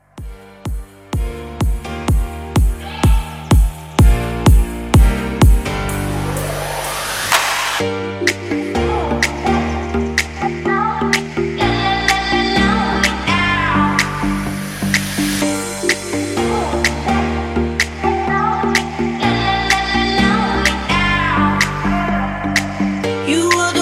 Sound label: Future House Mashup 126